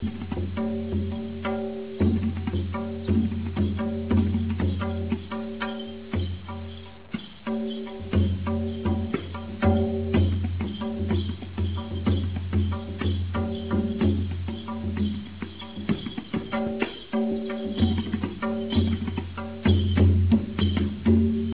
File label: drum kit